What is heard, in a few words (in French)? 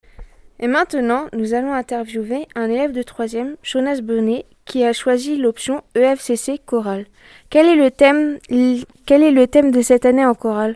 theme chorale